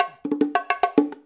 FILL, Percussion; FILLS; InVision Interactive; Percussion Slam

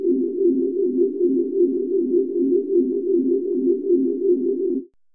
seq loop, ambient; AMG - Vince Clarke Lucky Bastard

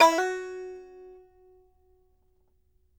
BANJO NOTES, GB4; Sampleheads; Whole Lotta Country 1 & 2

